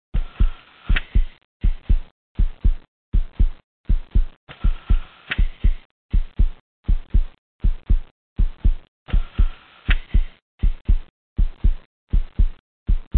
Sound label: lofi throes of death heartbeat; Sound FX Real Life